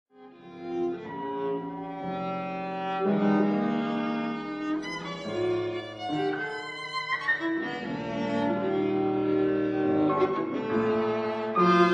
Composition For Viola & Piano; Babbitt

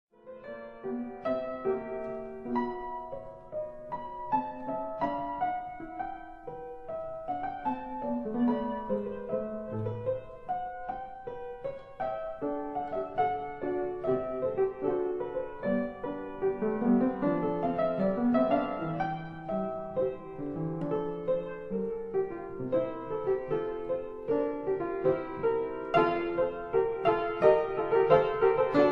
Etudes for piano, D minor

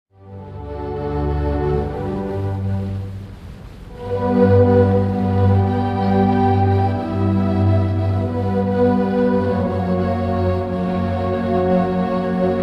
Violin Concerto in D major Larghetto; Beethoven; Op. 61

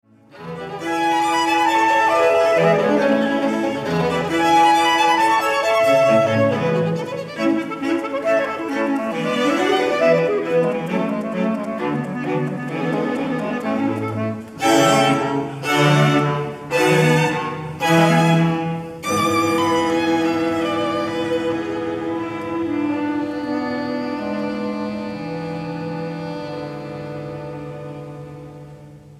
Clarinet Quinet in A Major Allegro con Variazoni; Mozart; K581

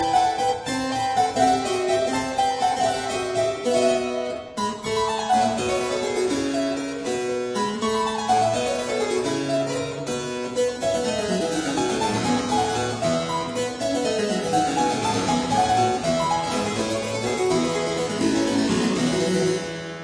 Keyboard Sonata in A major Allegro; P 132

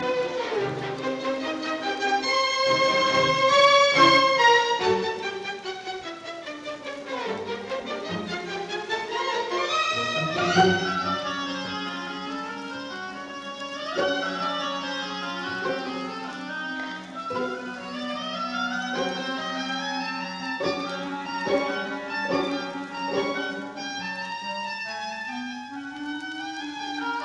Symphony No 9 in E flat major Allegretto; Op 70; Boston Symphony Orchestra

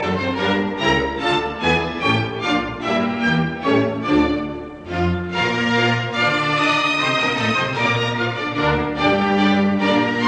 Symphony in G minor Menuetto Allegretto; No 40; Wilhelm Furtwangler

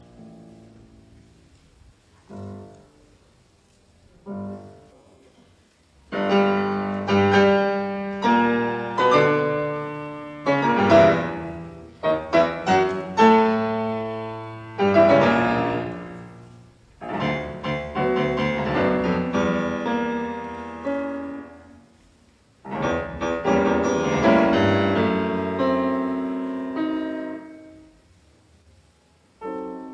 Piano Sonata in B minor; Claudio Arrau

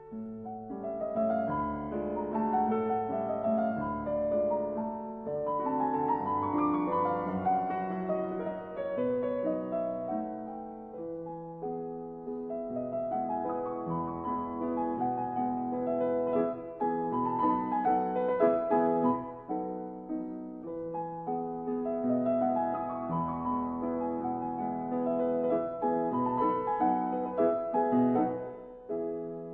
Mazurkas in E major Moderato; 10 Mazurkas Op 3 No 4

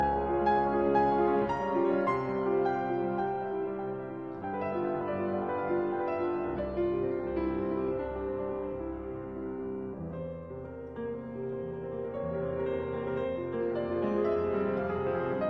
Etude in A flat major, Harp Study; 12 Etudes Op 25; Etude No 13; Juana Zayas

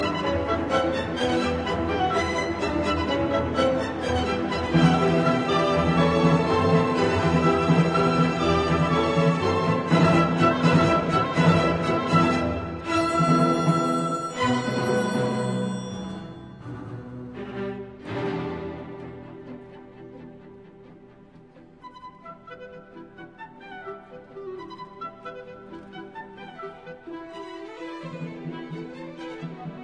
Symphony in E minor Molto vivace

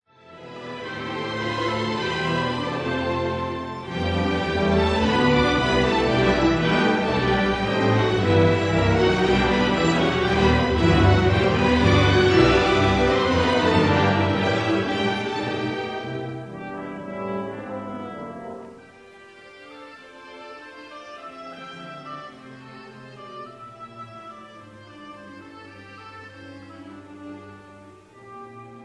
Dance Mazurka Tempo, Orchestra; North Country Sketches

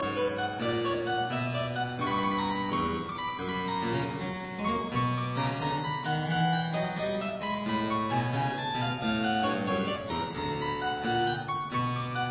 Lute Partita in C minor arr for harpsichord Gigue; BWV 997

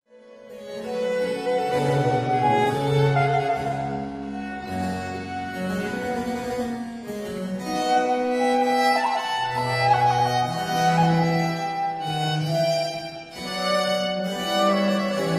for 2 violins & continuo Sarabande; L'Espagnole (La visionnaire); Les Nations

